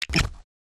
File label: brick no connect; build mode